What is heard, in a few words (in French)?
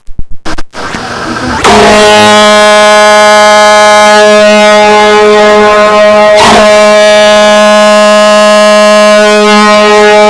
corne brume